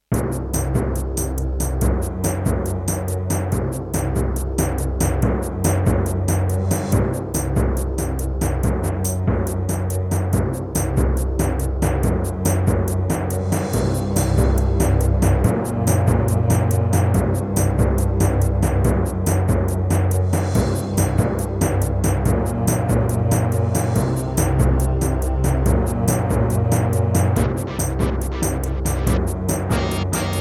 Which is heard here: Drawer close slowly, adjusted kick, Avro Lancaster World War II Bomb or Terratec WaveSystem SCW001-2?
Terratec WaveSystem SCW001-2